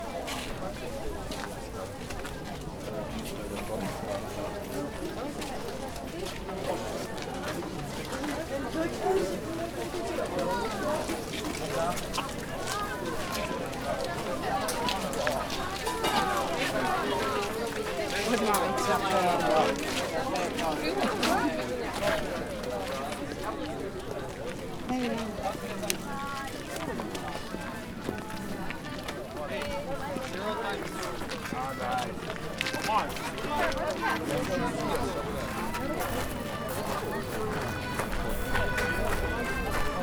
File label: Busy Streets; SFX; IRL Recorded